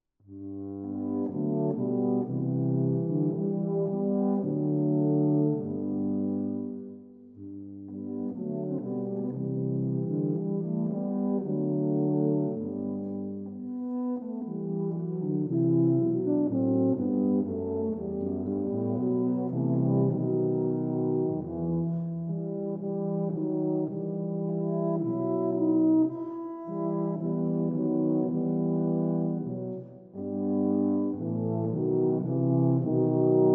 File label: Tuba Trio